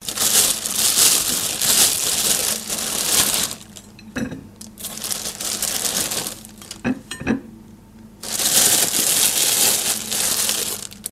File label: Bread Bag Rustle